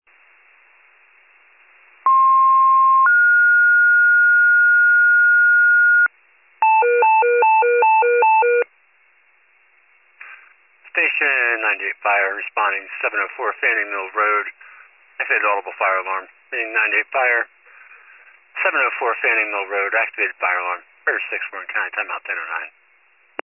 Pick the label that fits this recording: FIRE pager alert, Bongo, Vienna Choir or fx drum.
FIRE pager alert